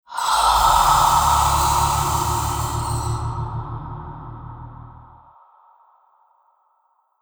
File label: alert; wicked; slender